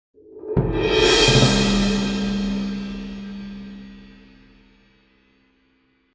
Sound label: Perc